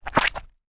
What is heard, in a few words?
Mud